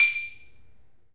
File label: 3D MARIMBA; 3D Sound; 3D Keys VOL-1